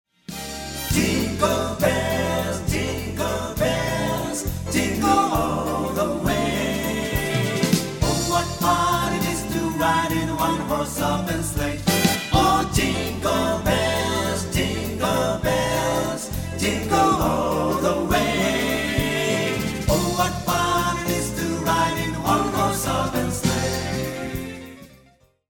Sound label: Jingle Bells